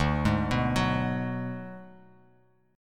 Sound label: D 6th Flat 5th D down, chord